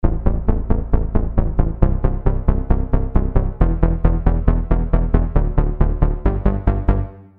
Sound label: K2600 Bouncy